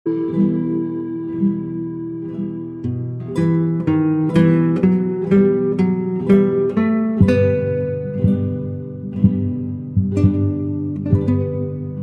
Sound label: el condor pasa ringtone